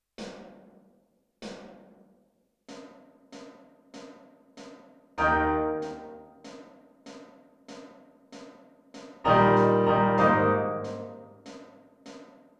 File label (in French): Piano début lent